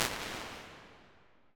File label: impulse response